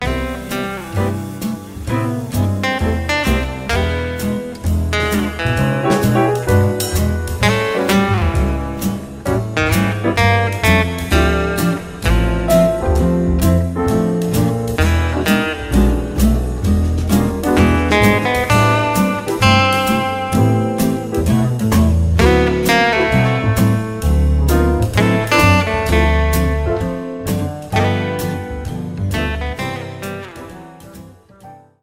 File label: Jazz